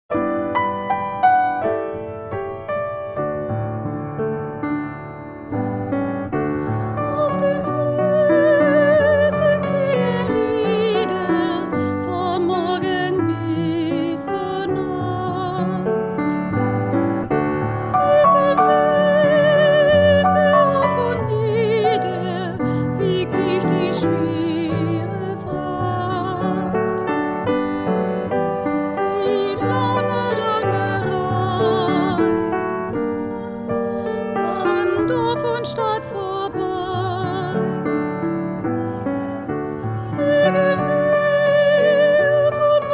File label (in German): 2. Stimme; Auf der Weichsel ab 2. Stimme; Eltern-Kind-Gruppen (Klangwiese)